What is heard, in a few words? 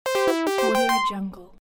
arcade win